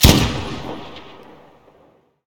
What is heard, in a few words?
br shoot; Tacz1.1.5+; RSWS 1903